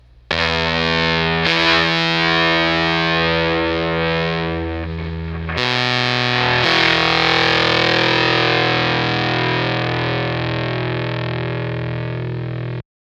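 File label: Fuzztest guitar broadcast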